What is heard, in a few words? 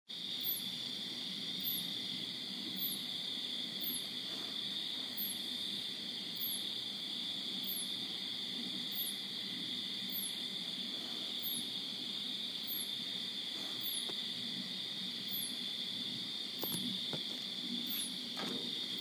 southern flying squirrel; hafner